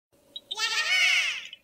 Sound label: yahaha; cave